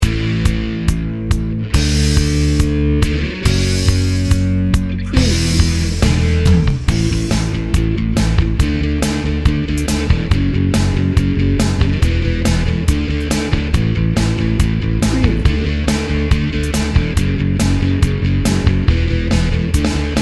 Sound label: indie rock party bed